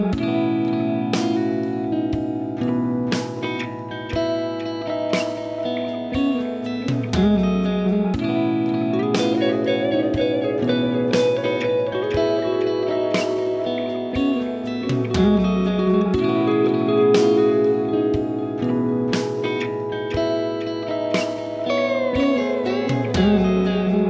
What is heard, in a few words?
music background